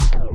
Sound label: vhs pause